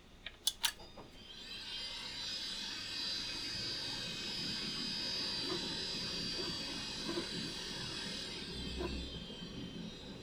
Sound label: quadro noise